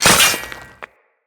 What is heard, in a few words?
Dagger Stone Impact; Weapons; Dual Daggers; Hit Effects